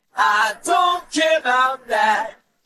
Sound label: care vocals